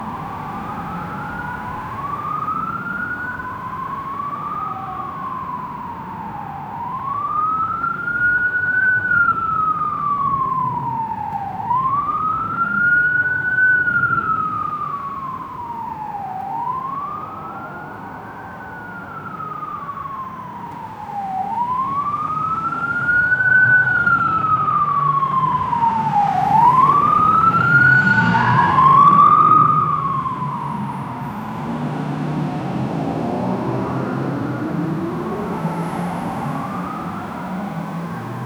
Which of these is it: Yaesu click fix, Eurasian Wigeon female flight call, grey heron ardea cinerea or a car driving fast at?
a car driving fast at